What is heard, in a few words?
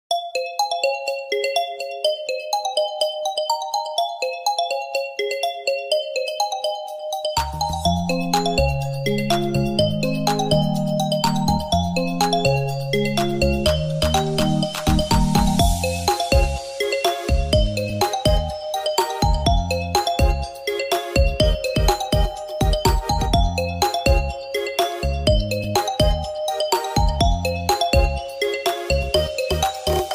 Fantasy Ringtone